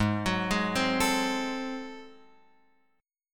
G sharp 7th Flat 9th G sharp down Guitar Open F, chord; G#7b9; arpeggiated; JGuitar